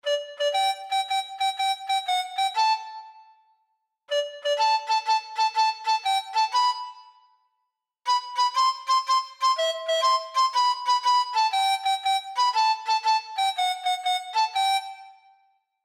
Hulusi

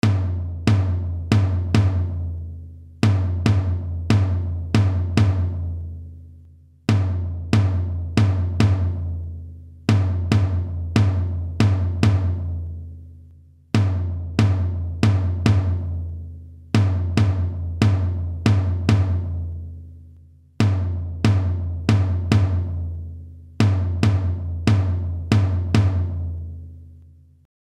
Davul

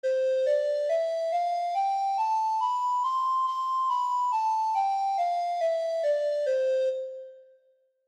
pan flut

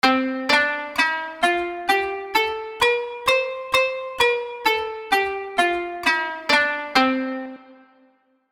Kanun

